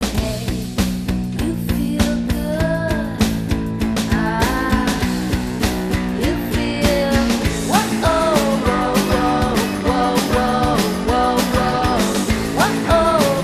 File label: acoust